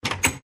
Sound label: Door Unlock; Minecraft